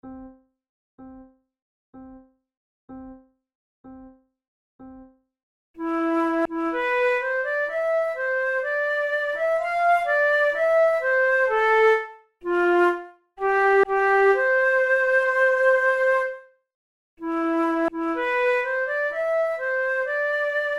duet, flute tunes

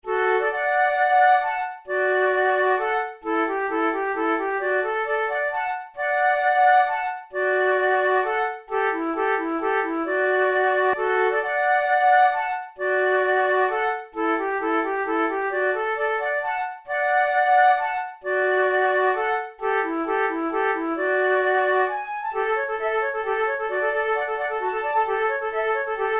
post horn waltz duet, flute

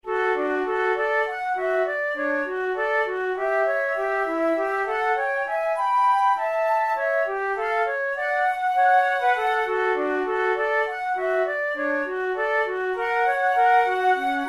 duets, flute; kohler; forty progressive duets vol1 no11

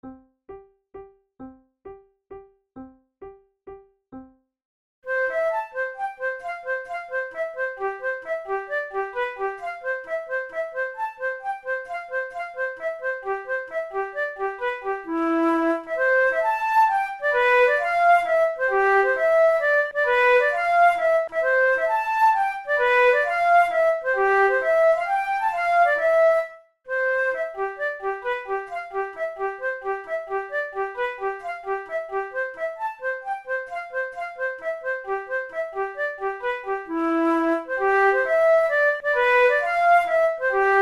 waltz duet, flute

